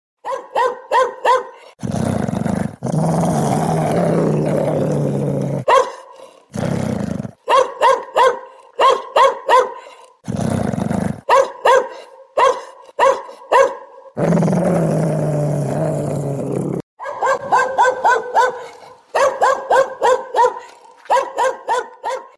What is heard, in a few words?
Bad Dog Sound